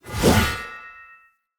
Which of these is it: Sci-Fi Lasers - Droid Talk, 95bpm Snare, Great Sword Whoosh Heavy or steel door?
Great Sword Whoosh Heavy